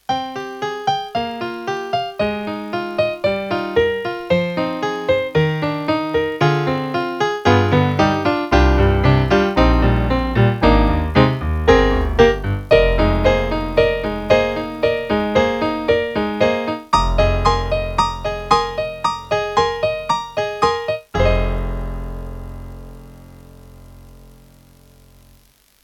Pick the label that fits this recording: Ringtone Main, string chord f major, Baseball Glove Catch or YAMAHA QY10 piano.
YAMAHA QY10 piano